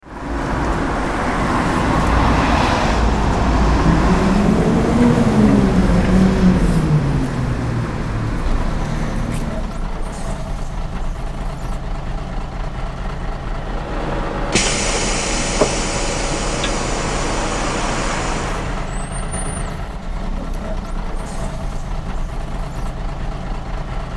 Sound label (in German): Geraeusch Bustuer; Hit from Heaven